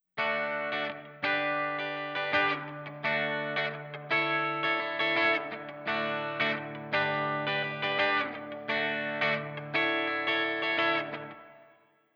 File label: reverb